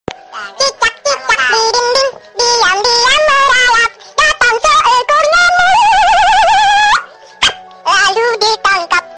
funny ding ding ding sms tone; funny ringtones

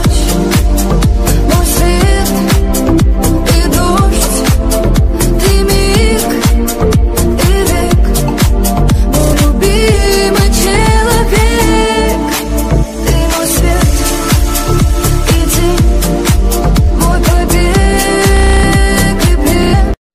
sweet music ringtone; english ringtones

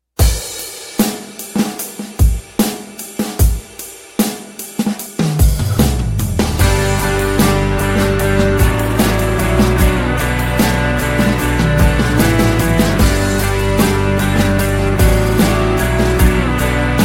guitar ringtone; yaariyan

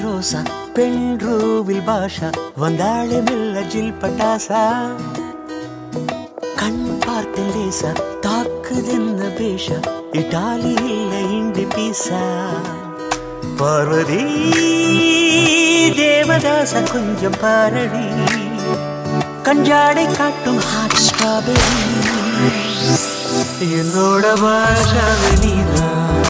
tamil ringtone

